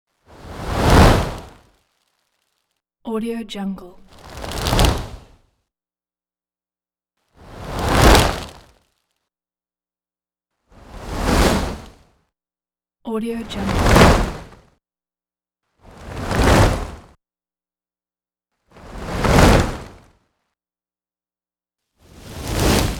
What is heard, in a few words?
whoosh by fiery